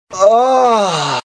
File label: ahhh; chatsounds